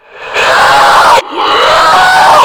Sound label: normal alert; floaters; slender